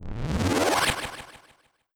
strengthen echo

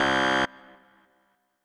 incorrect answer buzzer

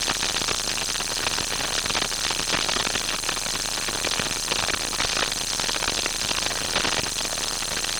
synth noise middle loop

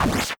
sword slash

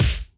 punch light

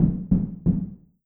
door knock iron synth from the other side

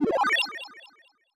synthesizer ascend short

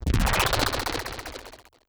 strengthen

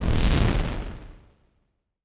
fire magic

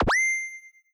exclamation mark middle

